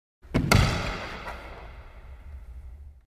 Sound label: Body Slamming Into Raquetball Court Wall